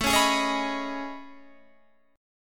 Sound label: A Major Sharp 11th, Guitar, Standard; AM#11; strummed; JGuitar